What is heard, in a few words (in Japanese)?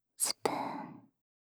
スプーン, ASMR; 【無声音ASMR】シャッフル睡眠法で眠ろう【バイノーラル音声】; シャッフル睡眠ランダム再生用